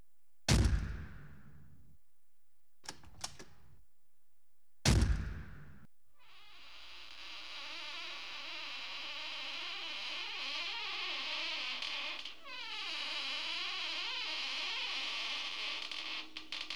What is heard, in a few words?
door noises